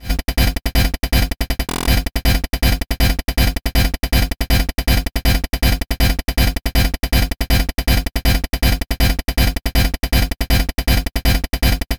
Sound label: techno sounding thing, bass